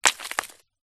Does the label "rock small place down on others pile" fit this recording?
Yes